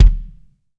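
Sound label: RAW bass drum, Drums Acoustic; USB Soundscan; AKAI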